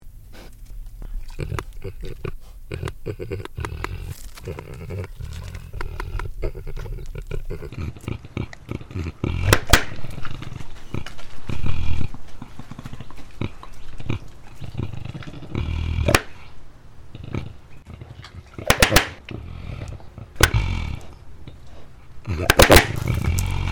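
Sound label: javelina teeth clacking; javlina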